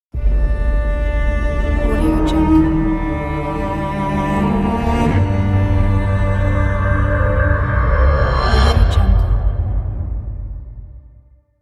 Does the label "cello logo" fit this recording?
Yes